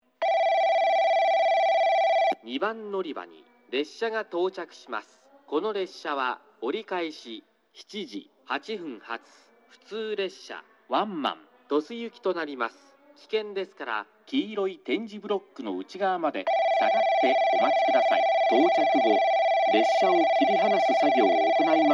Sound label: nagasaki local tosu; 2番のりば接近放送（折り返し 普通・市布経由 鳥栖行き）; 到着後、切り離し作業